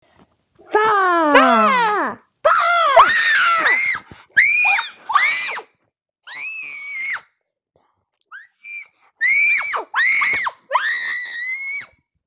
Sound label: faaaaaaaah hehe scream